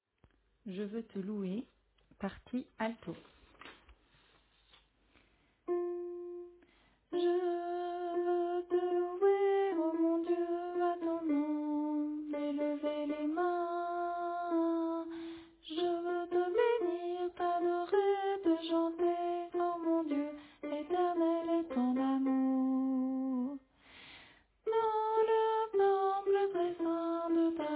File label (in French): Alto